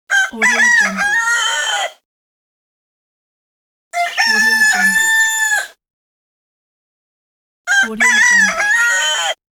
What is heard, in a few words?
rooster crowing sounds pack